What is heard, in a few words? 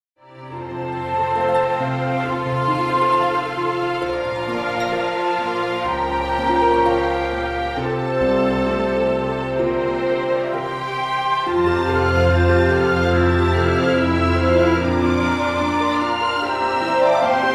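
karaoke instrumental; princess; disney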